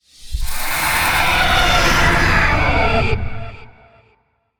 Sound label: Vocal Idle; Warframe; Zariman; Void Angel